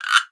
GURKE, PERCUSSION; Best Service ProSamples vol.42 - Session Instruments; PS-42 Session Instruments

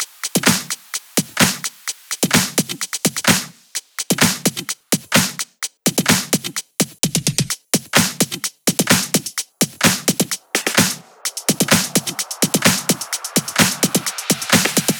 Drum Loop 128BPM FULL ohne BD; Vengeance - Essential Clubsounds Vol.5; Drumloops; Multi Loops

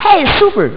jubel; Amiga Soundtracker sample

